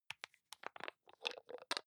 hygiene small screw cup open soft; haus-garten; badezimmer

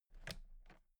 toolbox latches close very soft; industrie wirtschaft; handwerk

